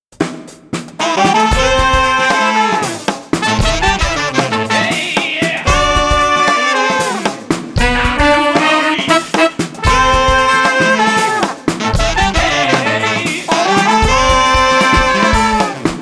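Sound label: Street Corner Brass Party with Vocal Shouts, 115 bpm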